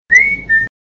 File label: Whistle